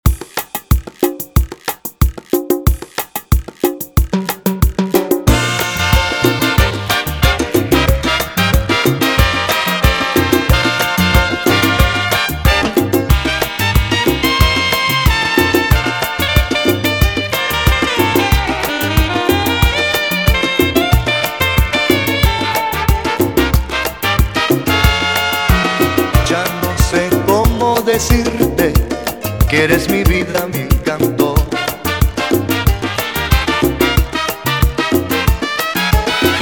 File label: 92 bpm